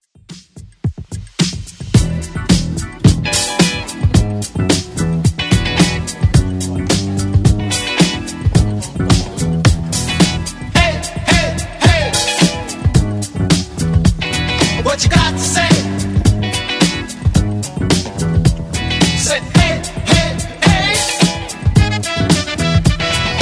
70s 109bpm; Redrum

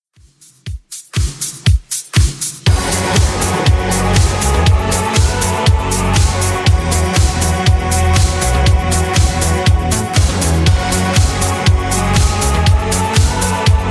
80s Redrum 120bpm

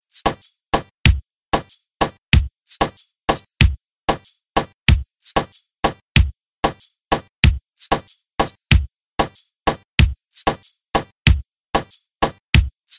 Reggeaton

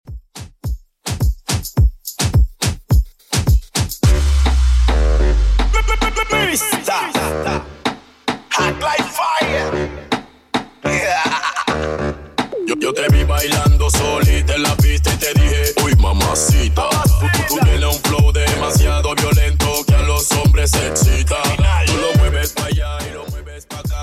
Top40 Moombahton 106bpm; Redrum

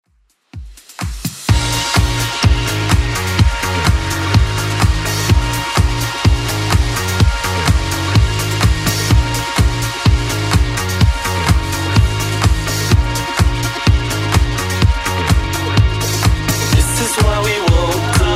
80s Club 126 bpm

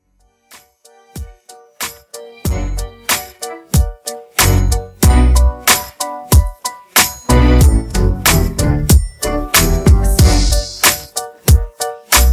Intro Outro 93Bpm

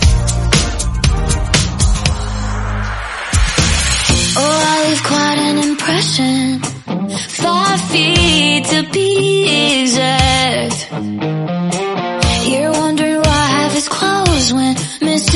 Redrum 118bpm Eb

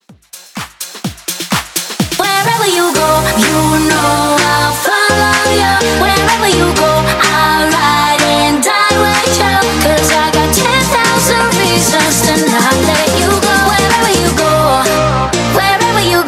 Pop Dance House 126 Bpm

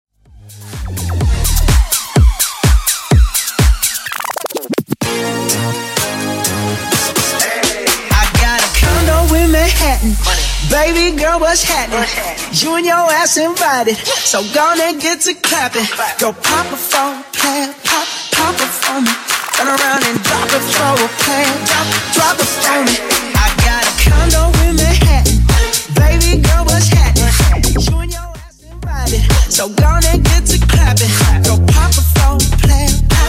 Tech House 126bpm; Epic In Reworkz

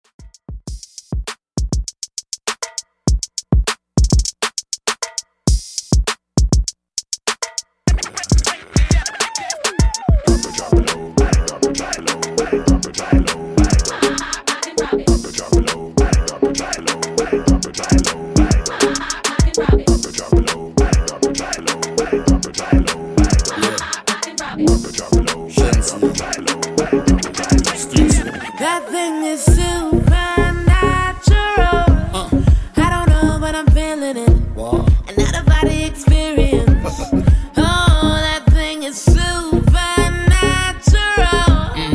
Hype In Outro Redrum 100Bpm

